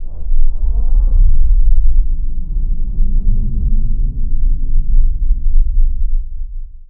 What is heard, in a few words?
Missile Launch